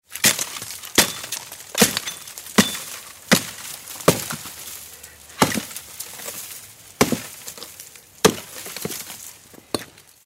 cortar leña hacha

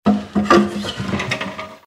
abrir baul

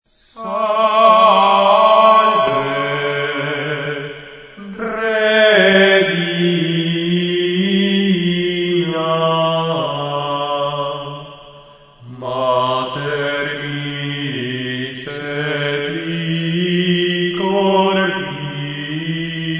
canto gregoriano

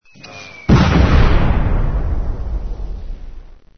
explosión

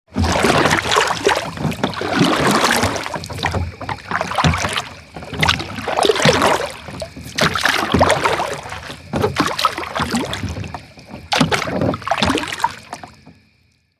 remar

